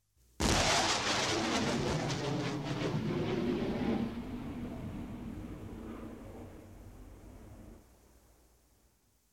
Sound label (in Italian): PARTENZA JET AEREO